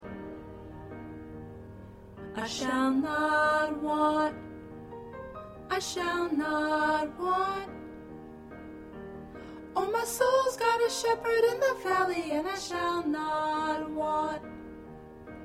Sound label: Alto